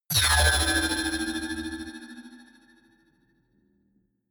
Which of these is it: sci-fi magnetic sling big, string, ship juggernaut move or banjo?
sci-fi magnetic sling big